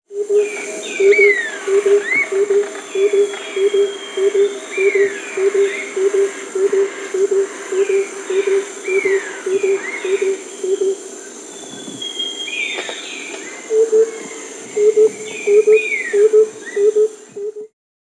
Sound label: Gilded Barbet (Capito auratus), Peru; S. America